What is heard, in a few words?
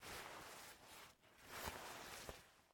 Take Guitar; game audio; MitaCap; MiSide